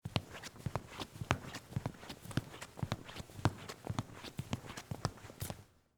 Stage Floor Walk, Footsteps, Stage, Floor, Walk, Across, Wood, Surface, Theatre; Footstep Sound Effects